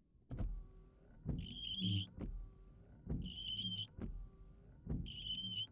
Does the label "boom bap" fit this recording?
No